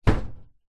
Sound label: household door internal wooden modern close other side bedroom room environment